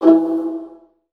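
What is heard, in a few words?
viola pizzicato E1 left, Virtuoso Strings; ILIO; Kirk Hunter Virtuoso Strings VOL-2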